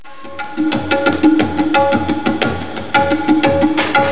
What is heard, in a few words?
TABLA